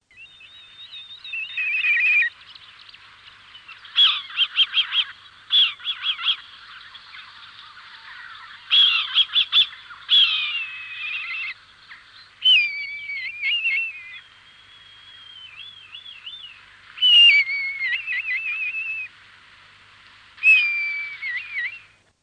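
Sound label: Black kite; Madarak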